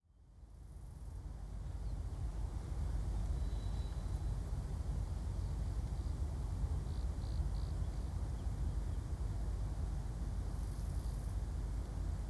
Windy Field Ambience With Insects And Distant Traffic